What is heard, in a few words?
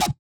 wobble